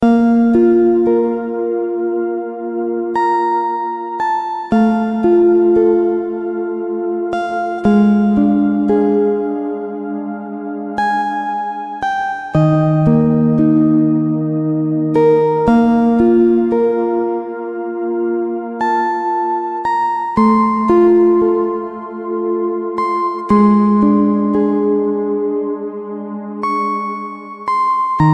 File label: relaxing i phone 7 ringtone